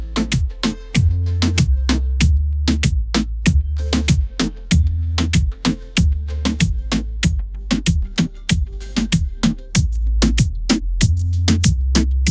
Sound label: tropical love reggaeton groove